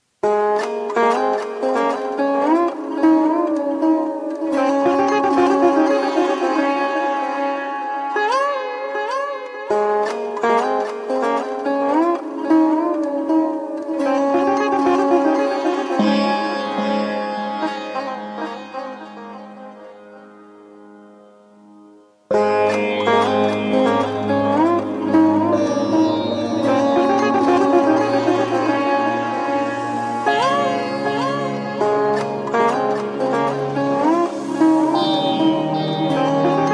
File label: ambient with nature ballada sitar